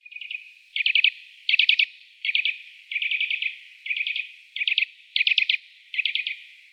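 obyknovennyy popolzen golos, Eurasian nuthatch voice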